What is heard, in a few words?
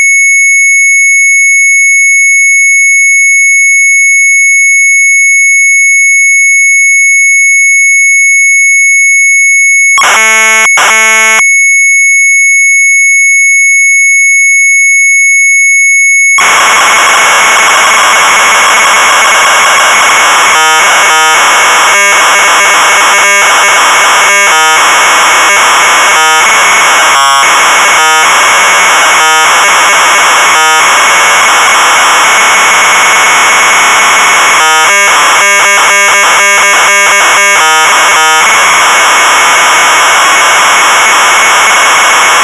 MZ800 Tape; SCHOOLBUDGET; sharp